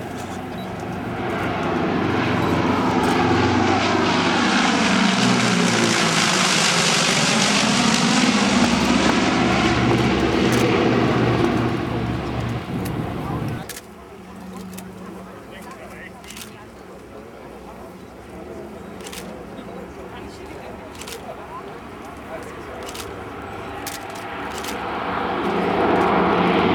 Superconstellation

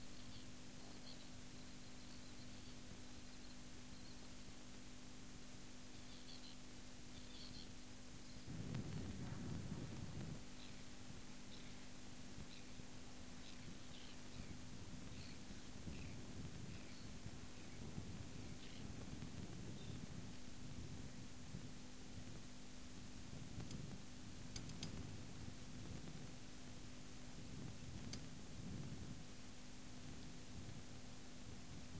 Double-banded Plover (winter)

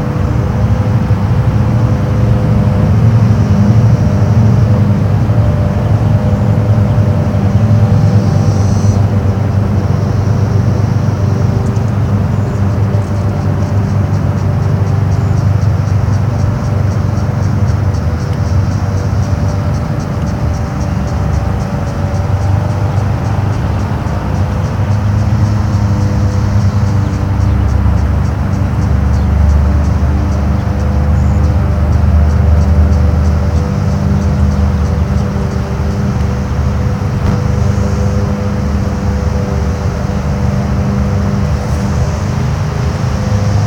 many cicadas

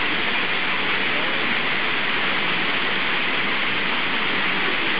Geronticus calvus

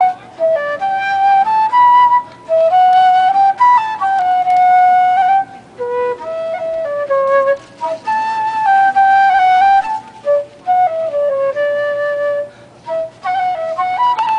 flutist; williamsburg

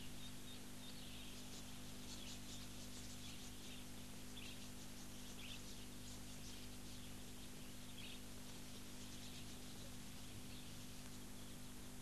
Budgerigar carpet

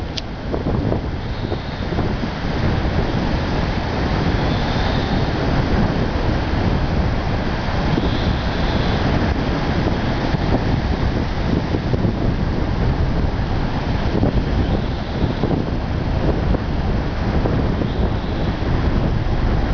Phoca vitulina

